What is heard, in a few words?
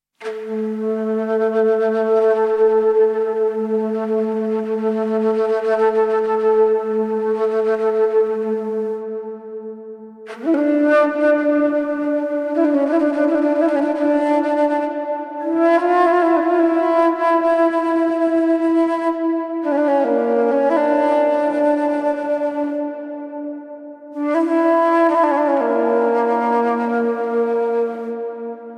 Calm Middle Eastern Flute Solo